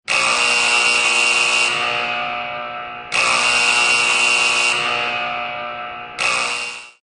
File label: Buzzer Alarm